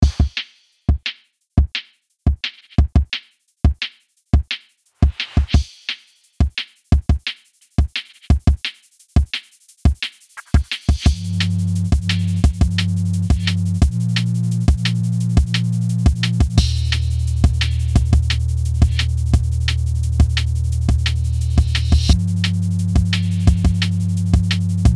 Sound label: Sinewave Assignment